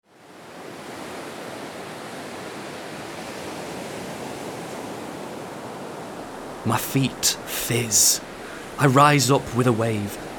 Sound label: Waves and Winds